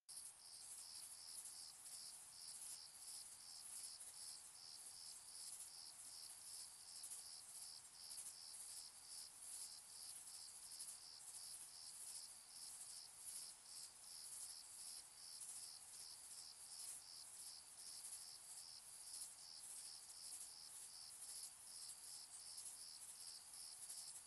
summer in the fields evening night distant crickets shrill high frequencies flat night; sonniss gdc